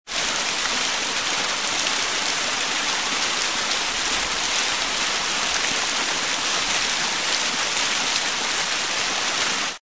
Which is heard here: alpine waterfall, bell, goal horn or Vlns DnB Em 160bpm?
alpine waterfall